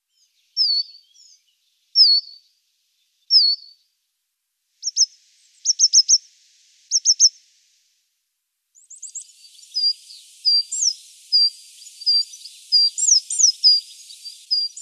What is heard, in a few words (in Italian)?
cincia bigia